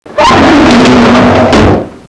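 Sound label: CLOSE door; HAUS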